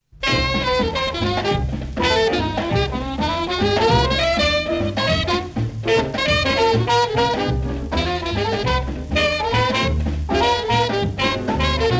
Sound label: bongo beep; Bongo Bop (B-flat)